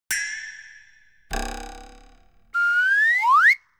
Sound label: Twang, Boing, Whistle; BBC Sound Effects Library